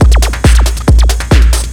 drm 138, Techno, drum-loops; Techno ID; modular-fiction

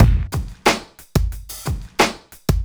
Street Tip, R&B Drums

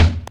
tom hit, roots reggae; Sounds Good - Roots Reggae

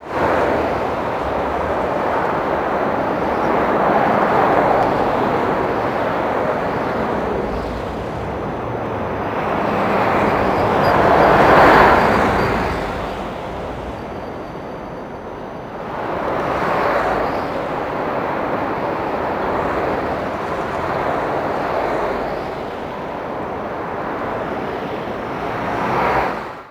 TRAFFIC, TOWN FX; USB Soundscan vol.02; Underground Hip Hop; AKAI

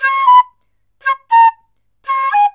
SERULING, Heart of Asia

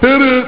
cry; Amiga Soundtracker Sample Packs

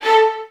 VN FX, Virtuoso Strings

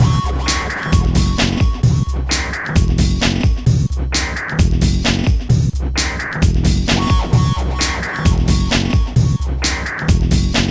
Funk, Hardcore Breakbeat, 131 F#min; Big Fish Audio; Hardcore Breakbeat Action; Funk Factory

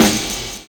OU VOX; Univers Sons

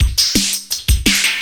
loop, 170 BPM, drum & bass

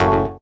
SYN, dance; Sound & Vision - Gigapack II CD 1

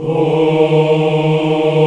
VOX, African Aahs; Roland LCDP11 Africa VOL-1

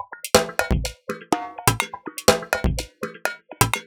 Top E 124bpm, House Drumloops; Zero-G House Fabrik Drumloops

